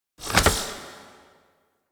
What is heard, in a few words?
Derelict Vault Key Insert; Orokin